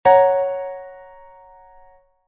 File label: F♯ chord inversion